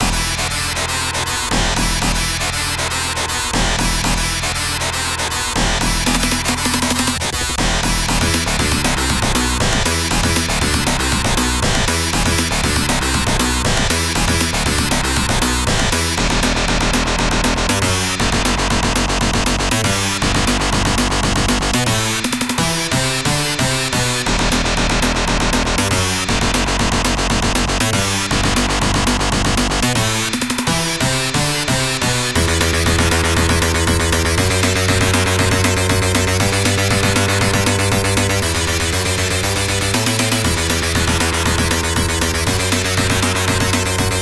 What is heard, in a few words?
Midboss; Eliminate Down; Genesis